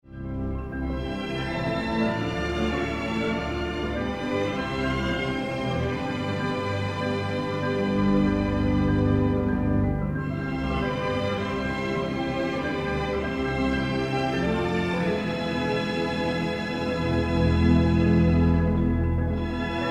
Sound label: Symphony for Organ